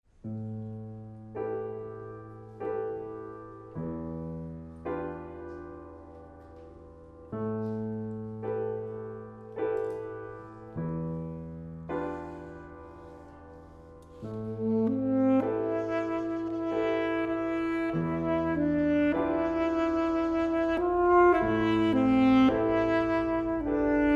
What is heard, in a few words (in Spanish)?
Concierto para Saxo Alto y Orq de Cuerdas (red a piano) Sarabande; Dubois